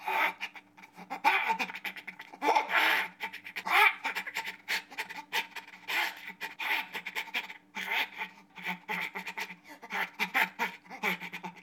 aggro loop

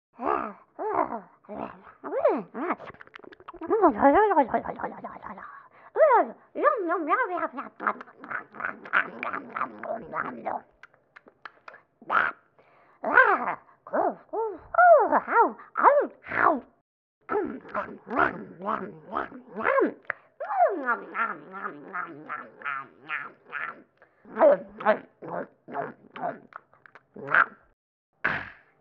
idle loop

